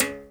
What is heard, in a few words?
Berimbau; Roland LCDP11 Africa VOL-1